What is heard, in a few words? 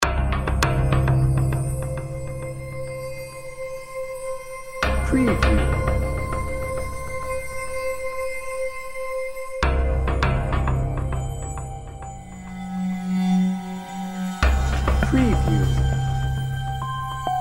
evil intention no drums